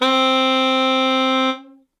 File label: Alto Sax Solo Legato; Ableton; Orchestral Woodwinds